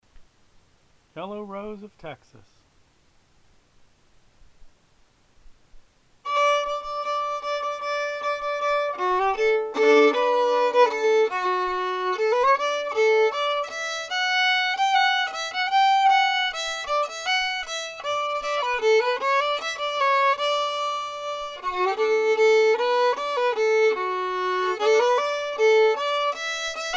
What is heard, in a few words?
fiddle; festival tunes